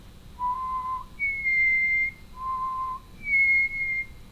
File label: whistle